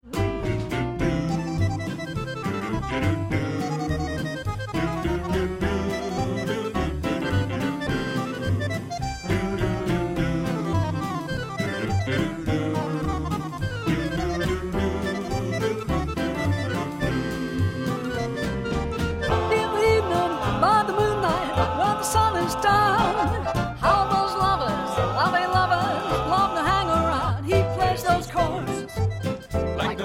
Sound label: Accordion